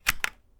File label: unplug USB